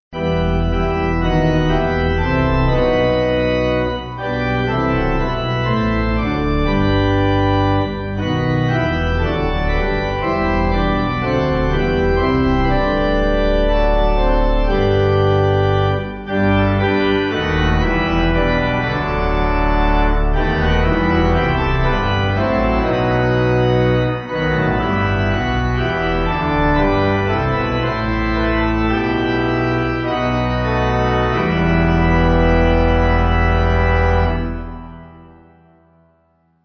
Pipe; The God Of Abraham

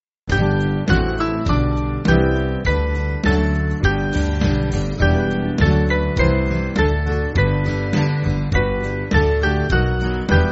Band, Music Backing Files for Churches; Small Band; How Shall I Sing; Old 137th

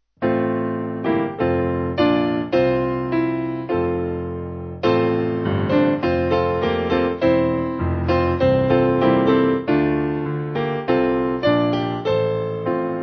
Piano

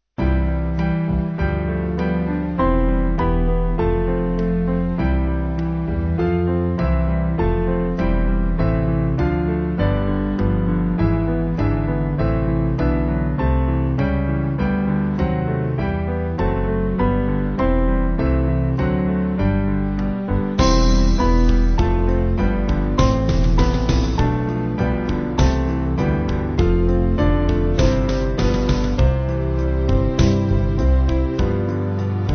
Band, Music Backing; Lauda Anima; Praise, my soul, the King of Heaven

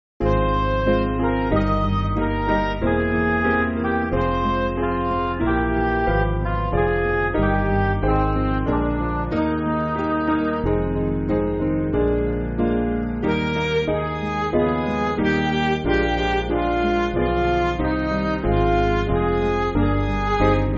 Midi; Lead Us Heavenly; Booth

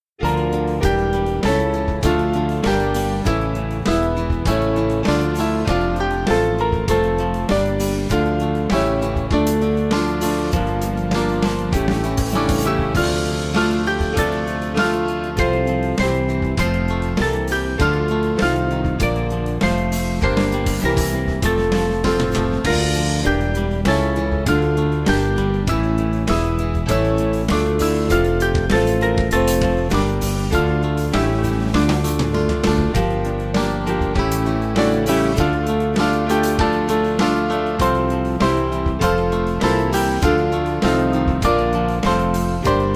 Fling Out The Banner, Band; Small Band; 4/Dm; Palmarum

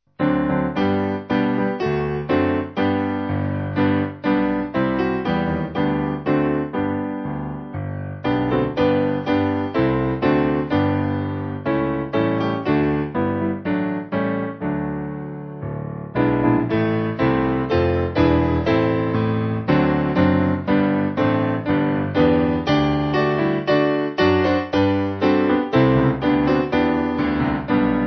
Piano

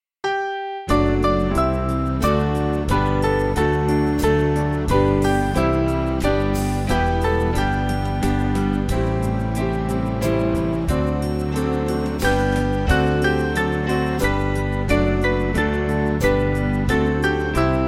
How Oft Have Sin, Rockingham, Band; Small Band

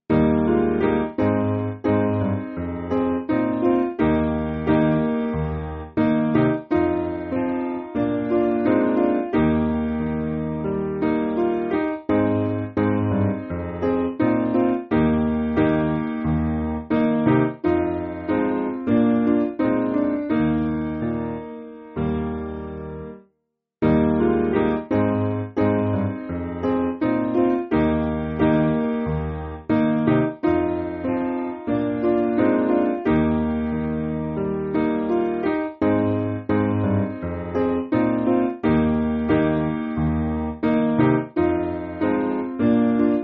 To Be Like Jesus Traditional SPiano

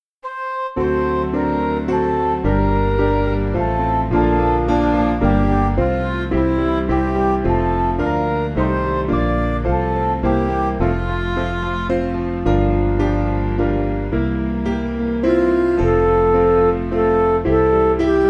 Lord Christ True Peace, Midi; Westminster New

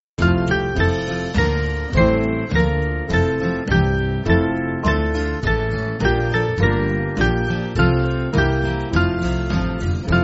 Band, Backing Files for Churches; We Come Unto; To God On High

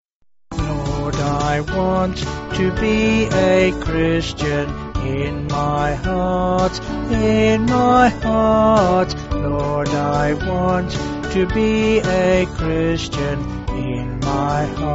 American Folk Hymn; Vocals and Band; Sung Lyrics

